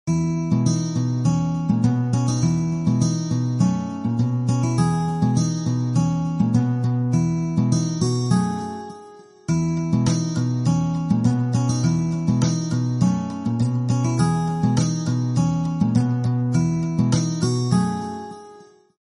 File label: infinix bgm; infinix ocean